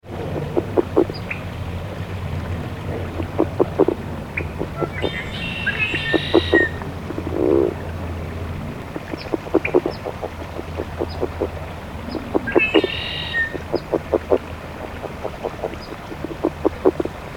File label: rdraytonii long